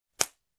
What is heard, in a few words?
Snapping